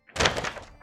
book drop; Daz3D

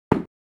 Add To Inventory; Daz3D

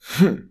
take damage; Player; RPG Creation Kit; RPG Sounds Pack 3

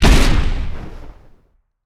Explosion; Opsive; UltimateCharacterController

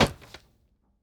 Footstep Metal; Opsive; UltimateCharacterController

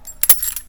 Keys Drop; RPG Creation Kit

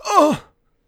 hurt; RPG Creation Kit; RPG Sounds Pack 3; Player

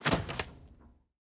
Jump Metal; Opsive; UltimateCharacterController

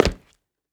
Footstep Tile; Opsive; UltimateCharacterController

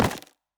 Footstep Wood; Opsive; UltimateCharacterController; Footsteps

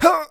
hurt; RPG Creation Kit; RPG Sounds Pack 3; Player

